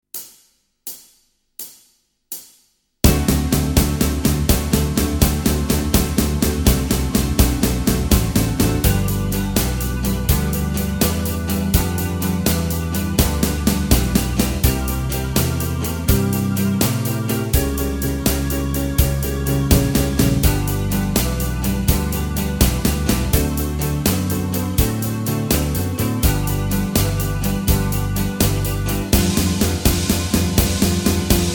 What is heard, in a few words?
No Lead Guitar